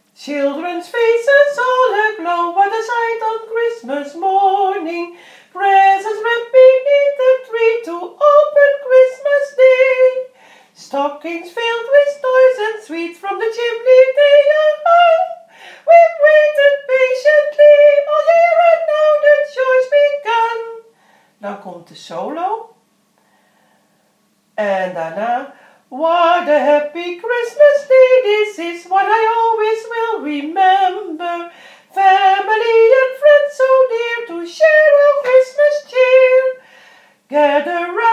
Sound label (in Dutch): langzaam midden en slot